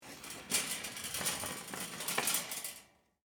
Shopping Cart Turn, Industry, Shopping, Cart, Turn; Stores and Malls; BlastWave